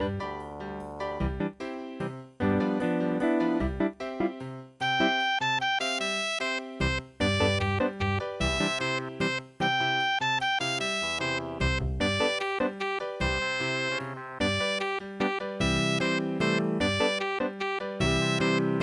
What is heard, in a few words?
jazz waltz; midi; traditional